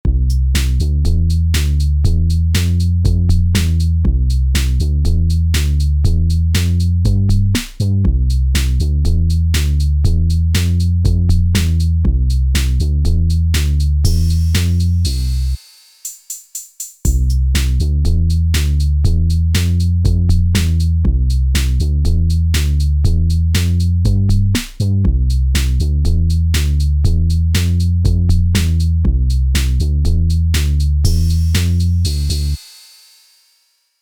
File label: bingo bass background music